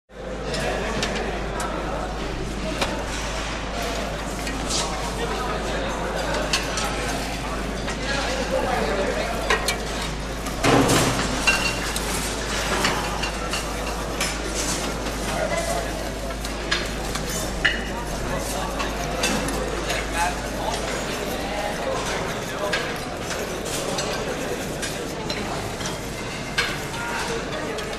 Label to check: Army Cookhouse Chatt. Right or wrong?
Right